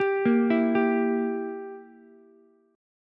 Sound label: E Diminished E, Ukulele; Edim arpeggiated; JGuitar